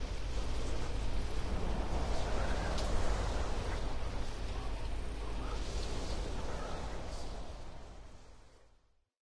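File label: wind loop; smite; horus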